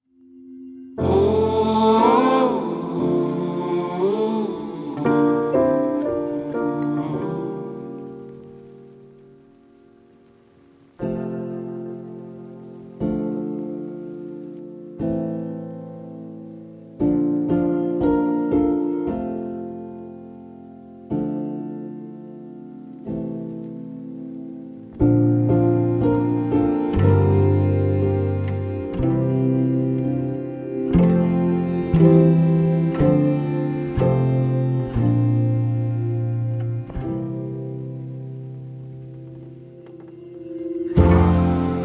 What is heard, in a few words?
Karaoke Version; Eurovision 2022 - Switzerland